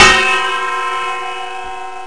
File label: bong; Gravity Balls